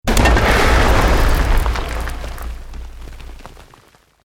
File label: EXPLOSION MEDIUM STONE ROCK